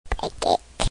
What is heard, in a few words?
piglet